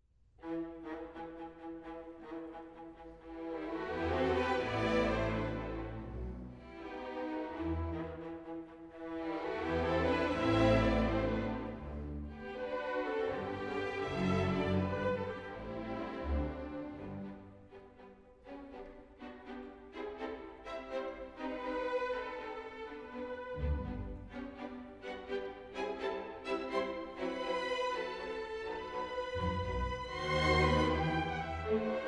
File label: Serenade for strings in E Minor; Edward Elgar